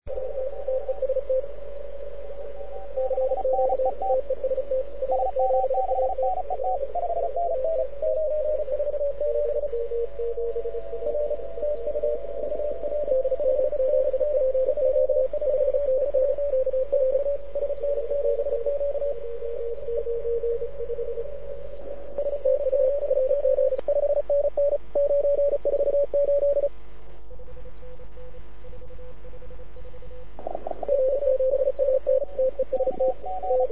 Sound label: 18MHz; russian 2017; contest